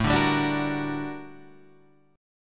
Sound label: G Minor Major 7th, A, guitar chord; GmM7/A Chord {5 5 5 7 7 6} strummed